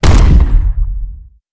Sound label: adv kinectic fire, weapons; Stellaris